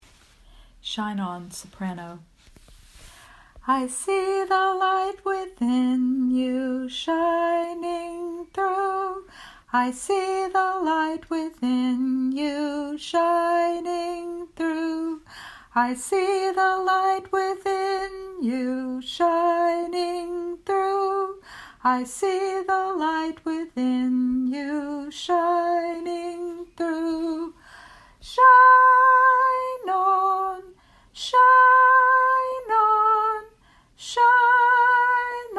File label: Soprano